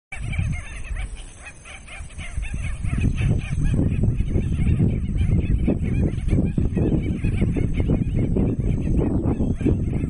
canto do pernilongo de costas brancas

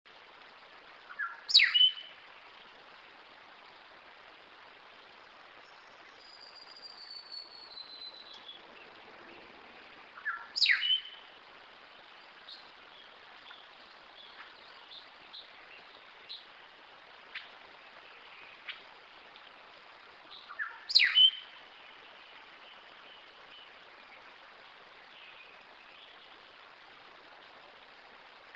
Canto do Tecelão

